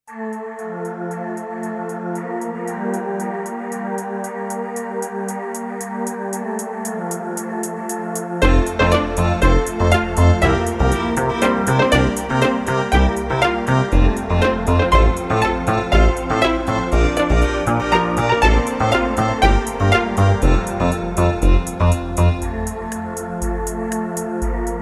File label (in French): VERSION KARAOKE; Alex et Zoé 1